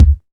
Smooth Kickdrum Sample D Key, Royality free kick drum single hit tuned to the D note